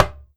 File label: Perc Djembe Bass; Circ Afrique V4